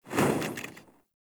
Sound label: Freedom Thrower Stop; Warframe; Caliber Chicks Minigame; Weapons